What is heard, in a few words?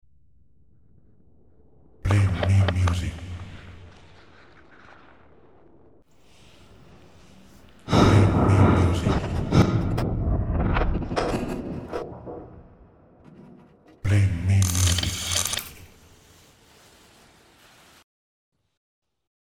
SFX WHOOSH